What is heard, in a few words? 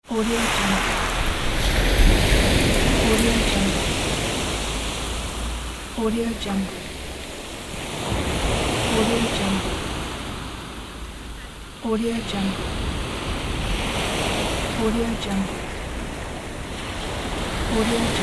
ocean and sea waves 3d binaural pack; m-i-r-k-i-c